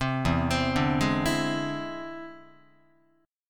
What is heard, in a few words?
E Augmented 7th E down Guitar; E+7 arpeggiated; JGuitar